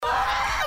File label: monkey